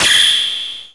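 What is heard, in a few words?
percussion fx; Best Service Dance Mega Drums; PERC-FX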